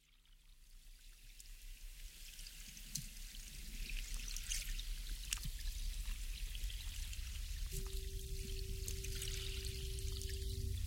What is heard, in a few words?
Mystical Relaxation